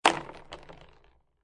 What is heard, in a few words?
Billiard Pool Snooker Billiard Ball Falls Into Pocket